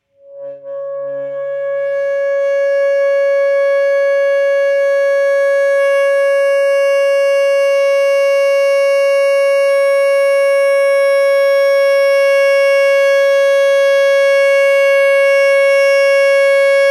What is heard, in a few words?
alto sax